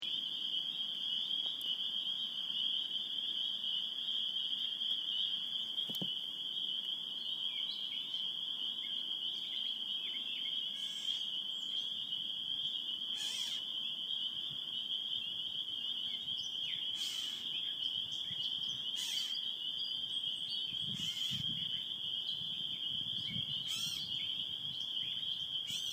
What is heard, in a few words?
Spring Peepers; Pinewoods